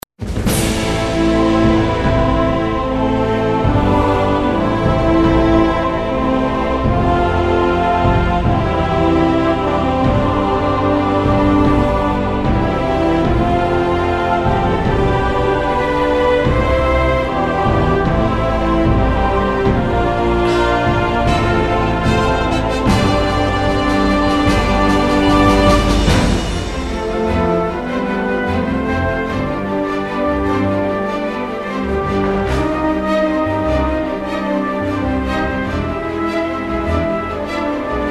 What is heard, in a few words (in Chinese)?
宣传片背景音乐